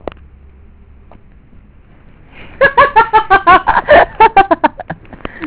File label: laugh